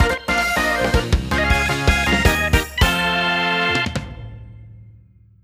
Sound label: Finish - 7th-12th Place; Mario Kart 8